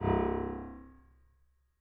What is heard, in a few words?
harm C1, Grand Piano; bitKlavier Grand; Piano Bar